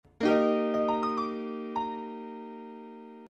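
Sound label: samsung galaxy note 10 new, ringtone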